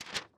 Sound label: real reel bounce; netent